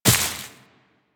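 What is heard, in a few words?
Step Vegetation, Footsteps, Walk; Warframe; Tenno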